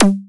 normal hit normal; rafis gothic hs

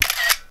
shutter

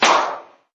combobreak

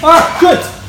combo break; hr + hr

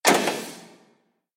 match leave; Stargvze v2

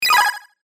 combobreak; Tokyo Ghoul v.1.0

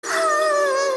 match start; Chika - 1.2.1

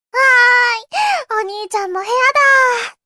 applause; no chika

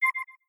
menu back hover; BNHA V.2

